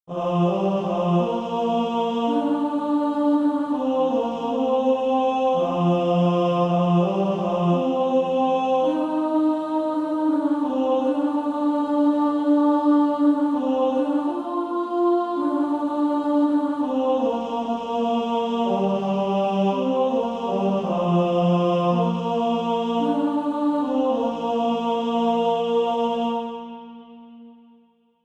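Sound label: Male Choir, tenor 2; Jesus Lover of my soul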